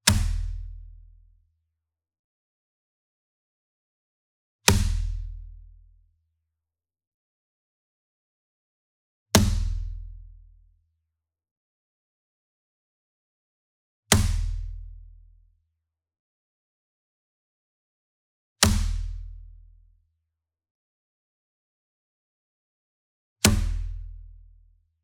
SINGLE DRUM Kodo Hit Whip Beater Near, Cinematic Strikes; boom library; Cinematic Strikes Construction Kit